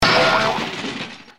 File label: Crash; Mr. Bean - Bean Skidding